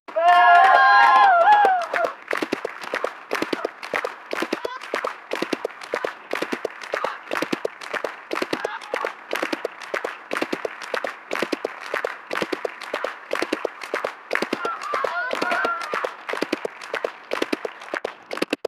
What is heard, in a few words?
crowd cheering woah yay